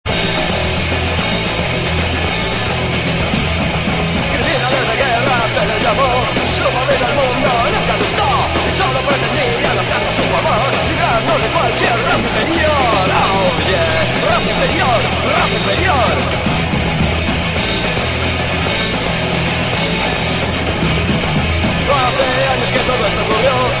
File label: BEAT